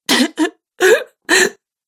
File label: Woman Crying Sobbing; Crying Sound Effects